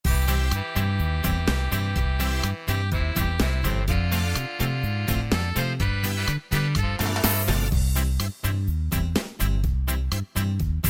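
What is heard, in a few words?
SKA C major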